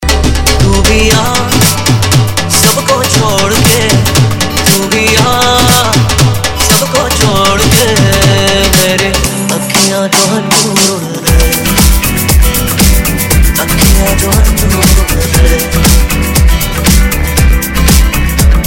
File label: bhangra Dj